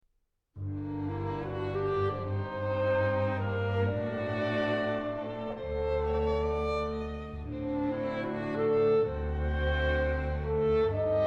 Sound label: Minor Love Motif; Lake Sync